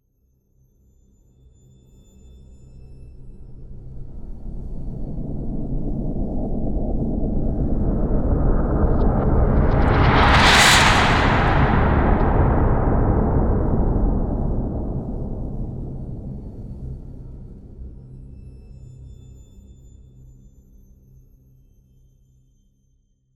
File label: SWIPE TRANSITION